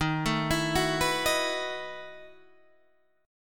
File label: E Major 7th Suspended 2nd Suspended 4th D sharp